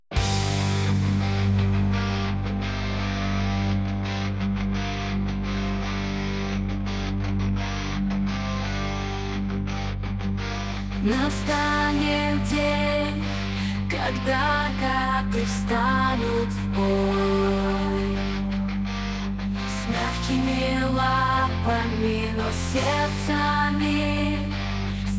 Cat rock

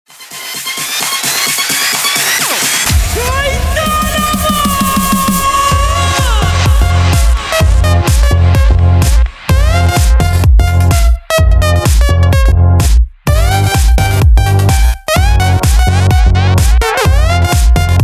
Terror ringtone; Это я,Равшан

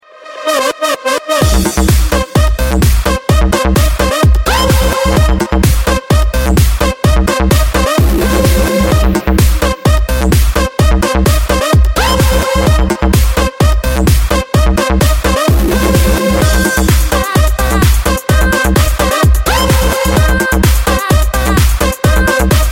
Electrica salsa club mix; рингтон

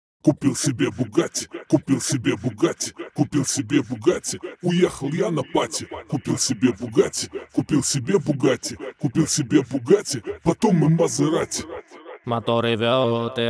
Vocal FX 165 bpm; Bugatti

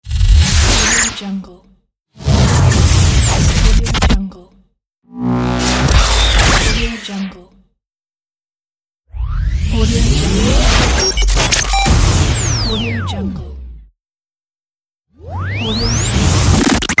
Radio Imaging SFX Pack; DASPro Studio; Radio Imaging SFX Pack 02

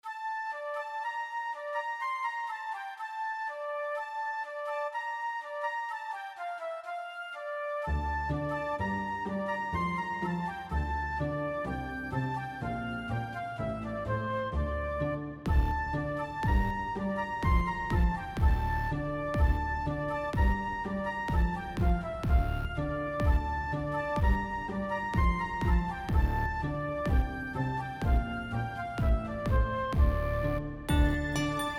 flute wind

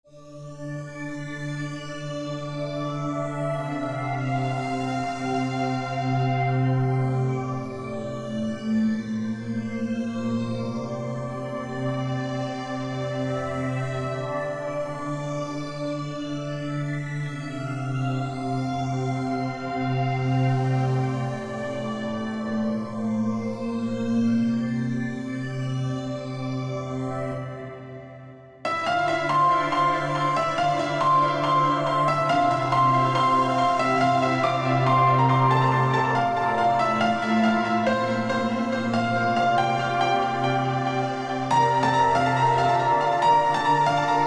Piano

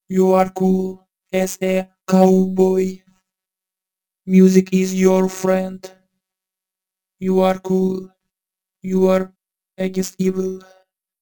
Acappella

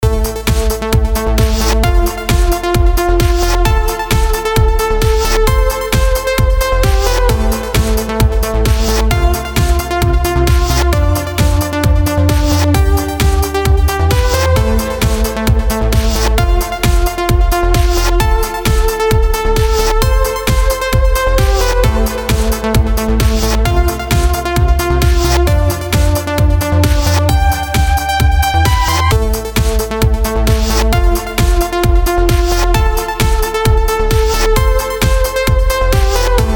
Mobile Rington

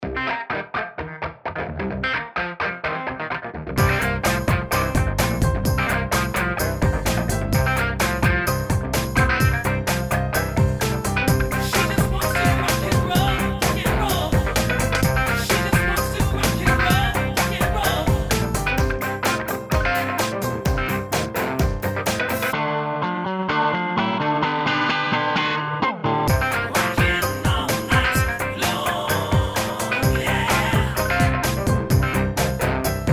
ROCK&ROLL

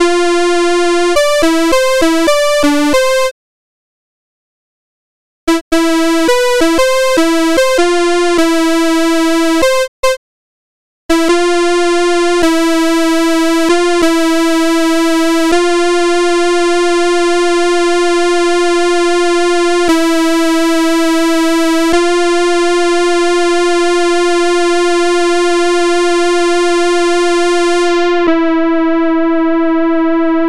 synth'sound, brutal sound

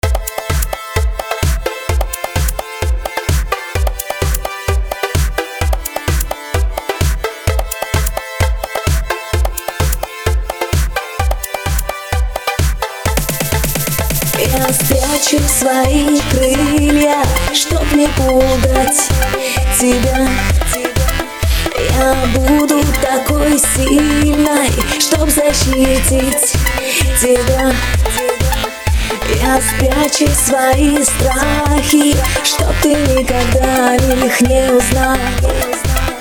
Drum remix

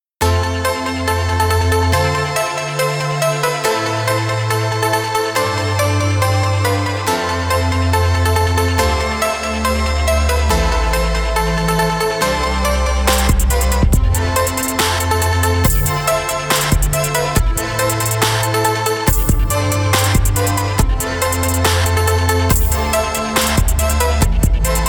Future Bass

